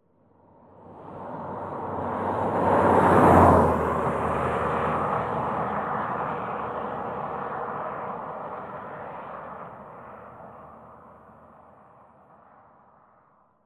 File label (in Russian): proezd legkovoj spokojnyj